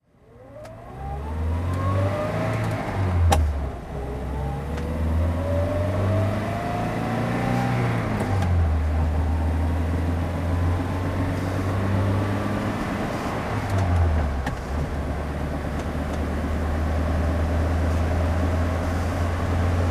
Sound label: peugeot 404 coupe driving, Engine sound driving; Peugeot 404 Pinin Farina Coupé, 1966; V3D/ ClassicarImages; Marc Vorgers